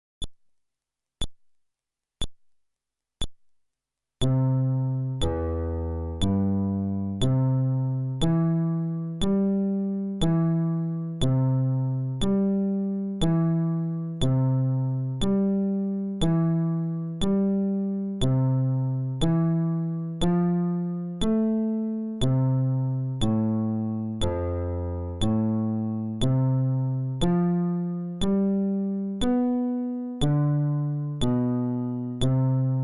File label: Arpeggio, fretless bass